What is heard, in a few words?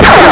pulse